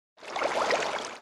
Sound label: water